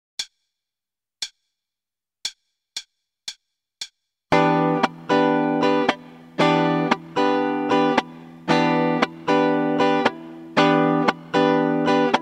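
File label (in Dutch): Ritme, gitaarstarter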